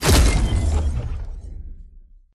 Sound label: frostbite fire; Skyrim Special Edition